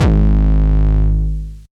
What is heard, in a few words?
808 Dist - G#1, Kicks; Mbase 11 Kicks